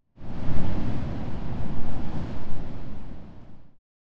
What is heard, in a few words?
wind gust